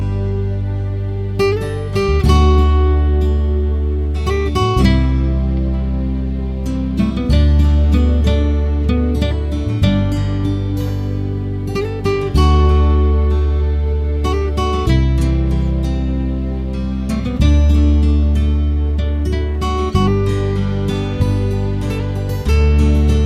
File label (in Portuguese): novo solo